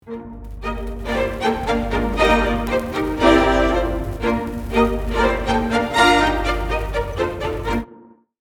Overture 1 Layer, The violins and violas are functioning like a choir, with each line in concerted rhythm with the melody, essentially acting as a single unit.; Rule of Three; The Nutcracker